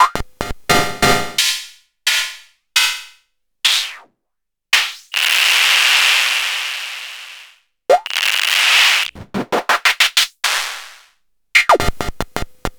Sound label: Weird Hats